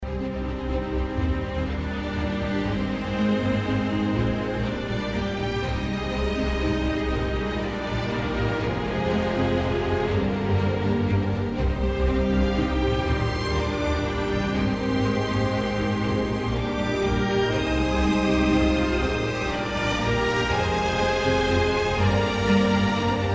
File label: Solo